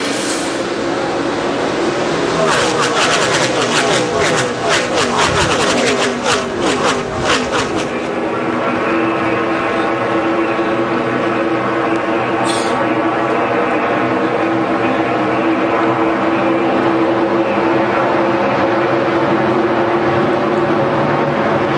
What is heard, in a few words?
racecars zooming; Sound FX Real Life